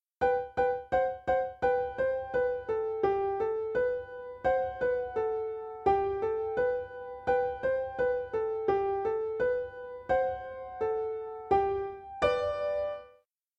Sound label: Duet